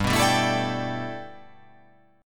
G 6th Add 9th G, chord

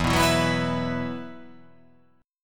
E Suspended 2nd E, down

